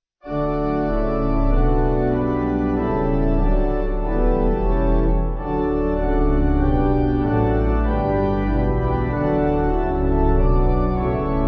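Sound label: Agnus Dei Pipe, Pipe Organ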